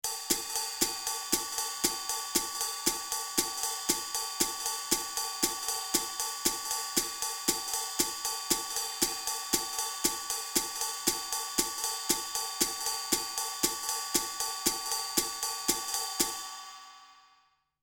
Jazz

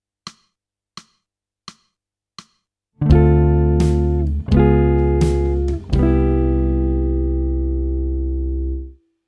jazz

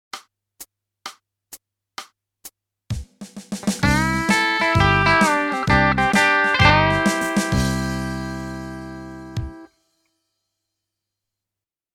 country

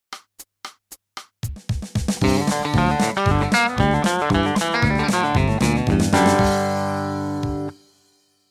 country

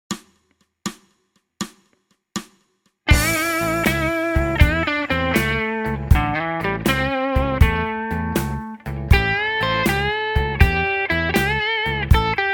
blues

